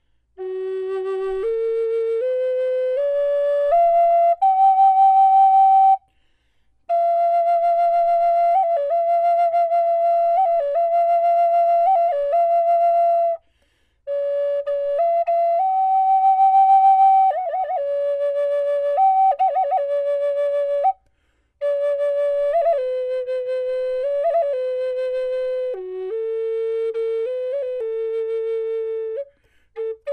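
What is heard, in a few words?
natural, wood flute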